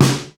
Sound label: short steel snare drum sample C sharp key